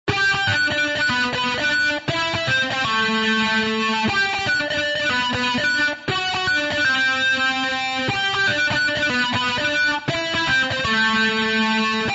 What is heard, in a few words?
Guitar